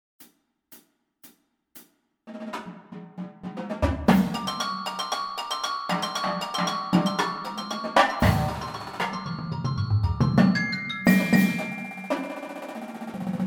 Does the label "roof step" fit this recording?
No